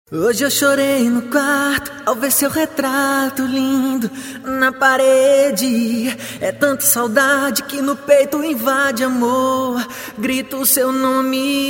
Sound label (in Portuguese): trio capela